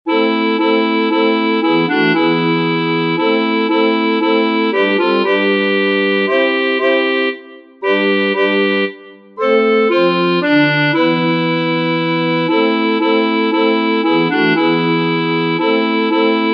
Laudate Dominum, contralti; Veglia di preghiera - Santa Cecilia 2022

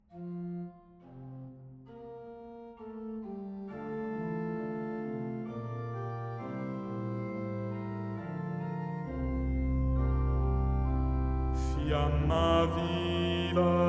Pellegrini Di Speranza RIT BAS

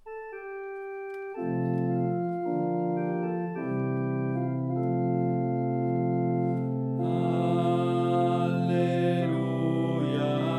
BASSI

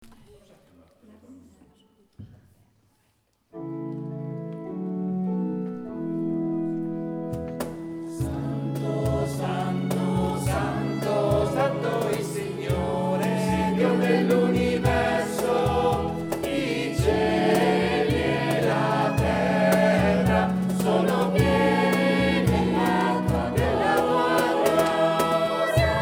Santo, con percussioni; Prove matrimonio Direttora con PERCUSSIONISTA

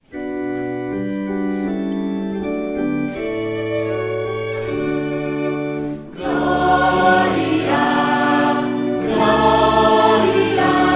Gloria Lourdes

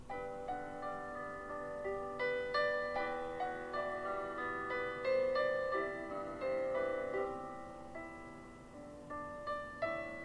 Dona Nobis Pacem, voce 2

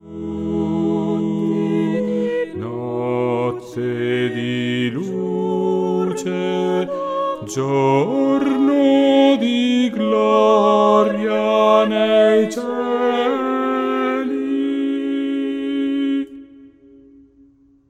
Notte Di Luce coda UOMINI; natale 2023

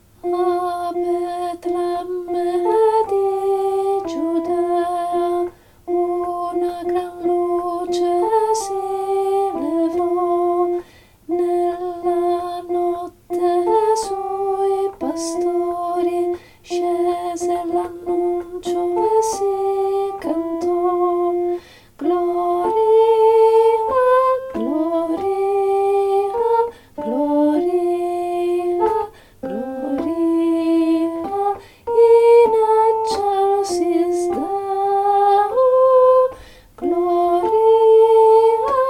A Betlemme Di Giudea, baritoni

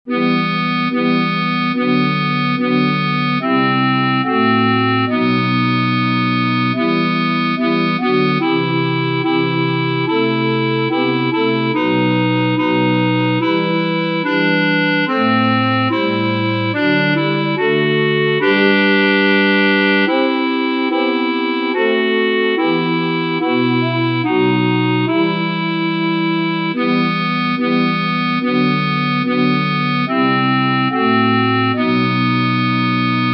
Tui Amoris Ignem, contralti; Veni Sancte Spiritus; Veglia di preghiera - Sacro Cuore di Gesù 2023; coro

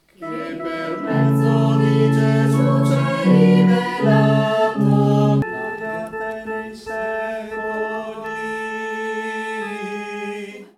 Eucaristia Gloria A Te, tenori; Giovedì Santo 2023; pasqua 2023

